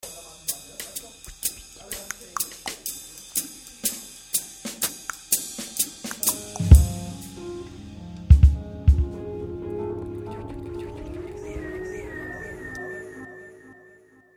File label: short jazz